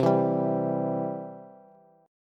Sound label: C Major, C, down, Bass; strummed; chord; JGuitar